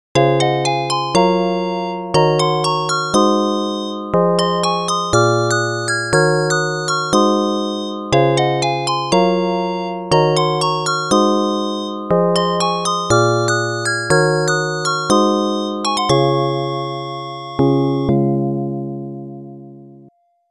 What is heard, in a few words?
Tone Doubler Test